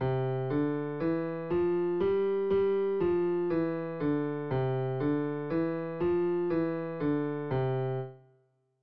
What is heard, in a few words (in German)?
linke Hand, klavier